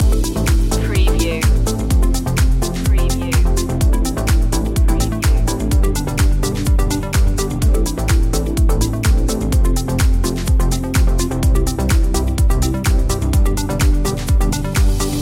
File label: positive direction loop